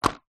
office chalk sticks in plastic container set down on table; zapsplat